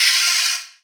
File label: Open Hihat; Cymbals; Vengeance Samples